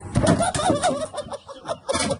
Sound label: HAHAHAHA